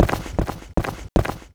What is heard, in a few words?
FOOTSTEPS Walking Loop; GameBurp; 2000 Game SFX